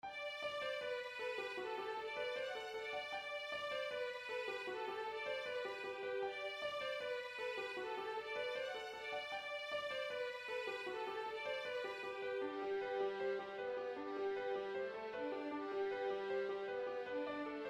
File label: Kincaldrum Melody Harmony, fiddle